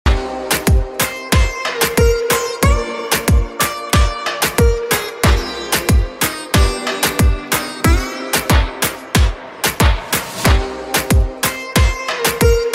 Sitar

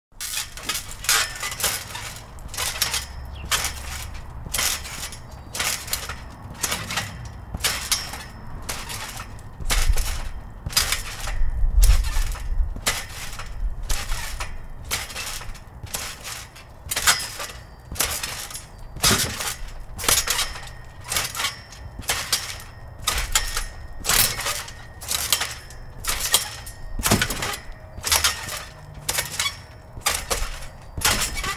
trampolining; ورزش; ورزش ترامپولین

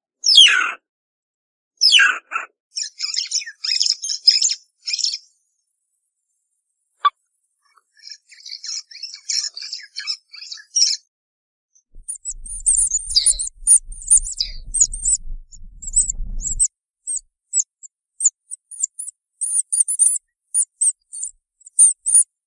Mongoose

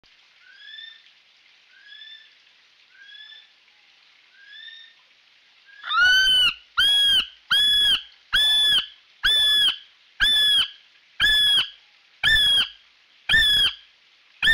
Kiwi; پرنده کیوی

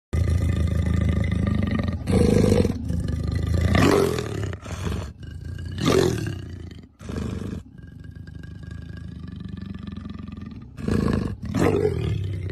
snow leopard; پلنگ برفی

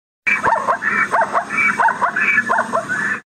Okapi

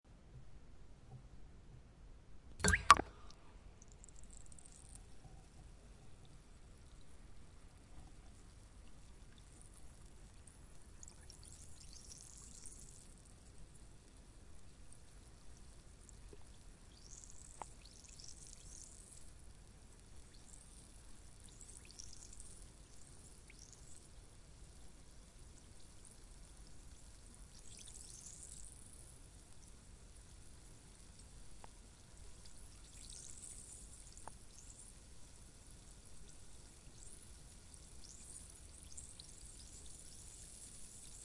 Effervescent Tablet

